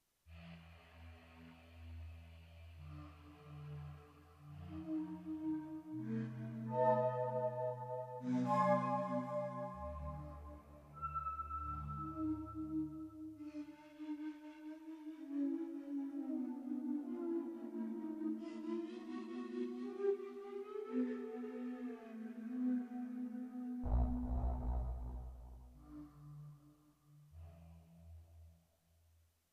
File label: chorus chained organist; juno g